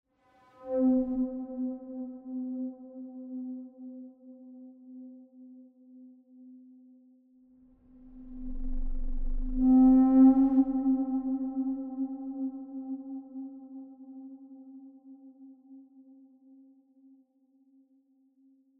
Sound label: Tension Block